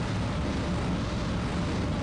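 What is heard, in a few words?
Booster Loop Interior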